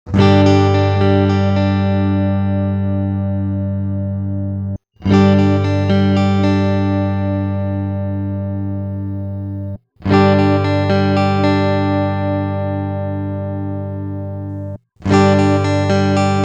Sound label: neck hb G chord coil moved aside